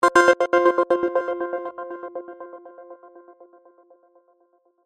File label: Multimedia Chime, Multimedia, Element, Chime, Stutter, Sweeper; BlastWave